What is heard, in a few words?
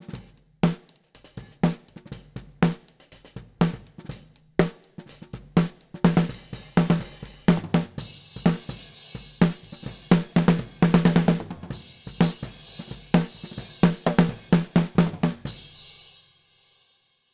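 amb pa snare top km84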